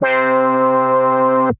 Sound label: Squish Dish, Synth Keys; Ableton; Creative Extensions; Poli